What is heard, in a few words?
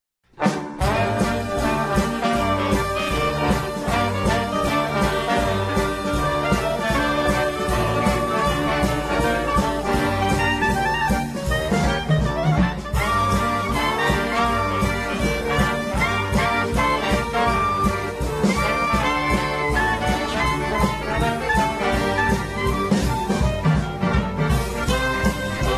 klezmer band; temple sinai